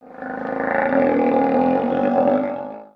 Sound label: Croc Groan; creatures organic